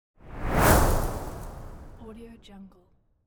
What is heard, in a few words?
woosh; welltone